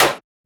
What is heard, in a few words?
Percussion; FLPRO